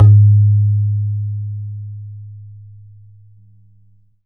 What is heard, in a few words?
BAYAN, Drums Percussion; E-MU Producer Series Vol. 4 – Denny Jaeger Private